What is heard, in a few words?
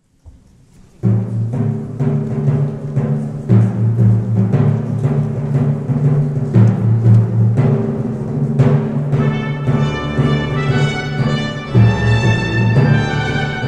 prelude trompete orgel; charpentier